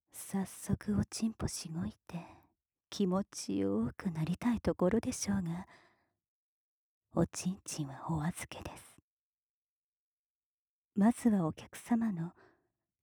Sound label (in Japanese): 乳首いじめ